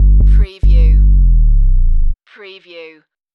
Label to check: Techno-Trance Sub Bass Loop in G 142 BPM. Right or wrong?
Right